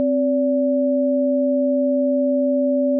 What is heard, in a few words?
Tones Stereo